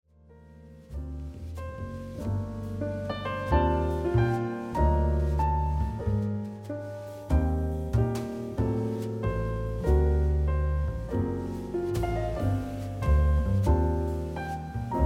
Savant Audio QRS Jazz mix

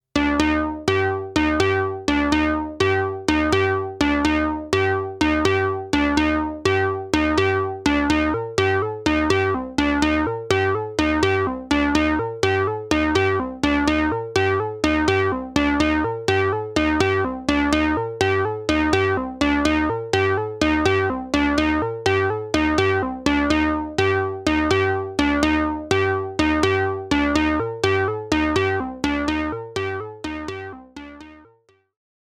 Urzwerg CV Skip; Nanozwerg

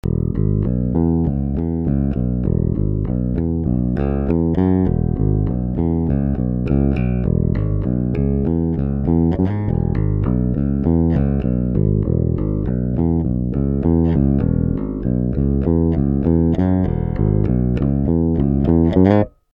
EQ neutral J Pickup

